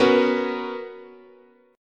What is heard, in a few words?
B Major 7th Suspended 2nd, guitar chord